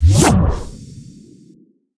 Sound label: deform cast